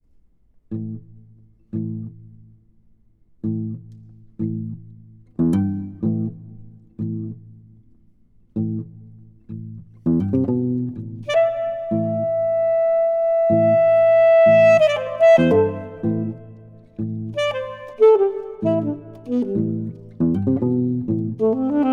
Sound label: Sonata for Alto Saxophone and Guitar